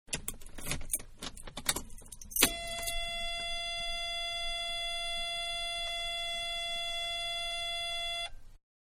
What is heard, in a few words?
Car Foreign-Key Into Ignition-Interior; Cars; Vehicles; Manhattan Production Music